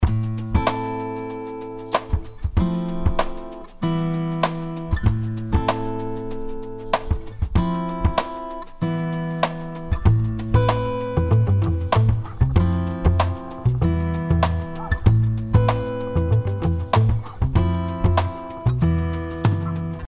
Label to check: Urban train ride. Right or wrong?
Wrong